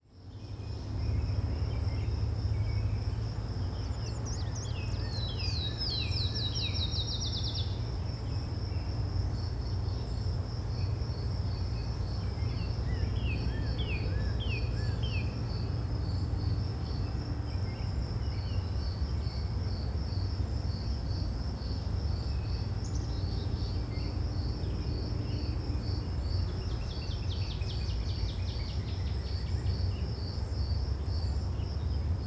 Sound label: nature birds chirp